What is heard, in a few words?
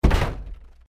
crash vehicle small